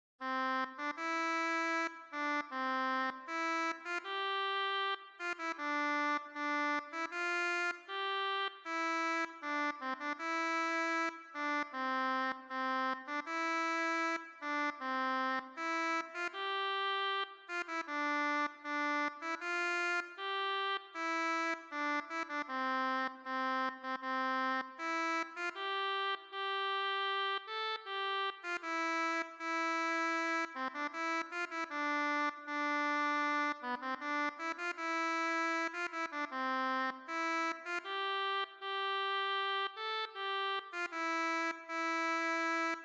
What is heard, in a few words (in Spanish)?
marcha procesional; dos mato